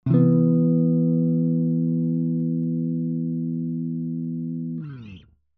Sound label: spdif clicks